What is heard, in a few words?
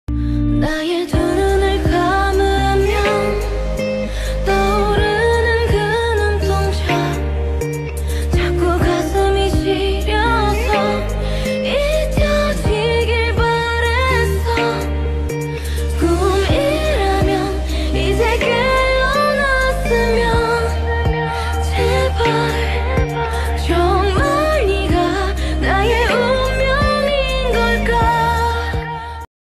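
original soundtrack korean ringtone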